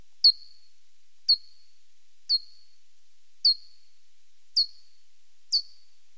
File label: P. nathusii, bats